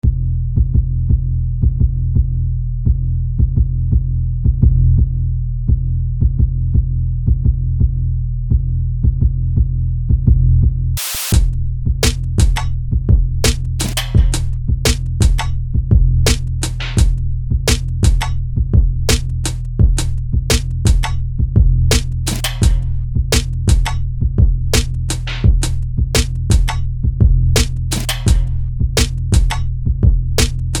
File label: beat